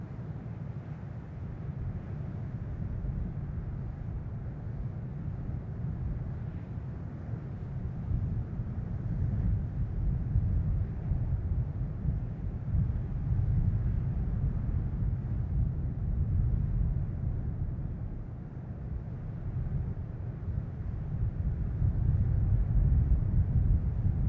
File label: Wind Ben A'an Loop; the 39 steps